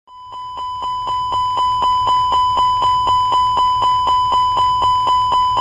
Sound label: airbus Master Warn